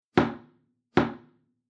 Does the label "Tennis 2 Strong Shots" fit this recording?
Yes